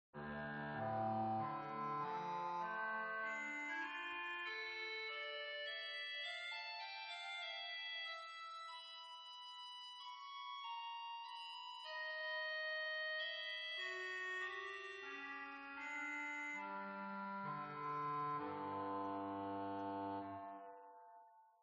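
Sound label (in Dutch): dulciaan 8, orgel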